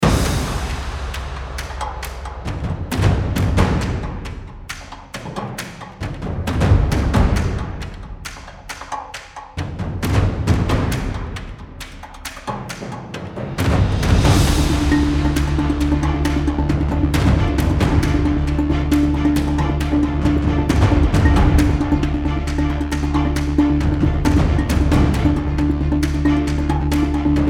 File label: Symphonic Intense